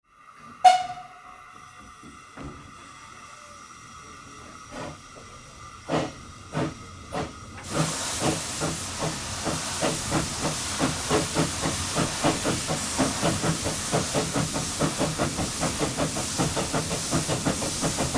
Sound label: old train cabin inside departing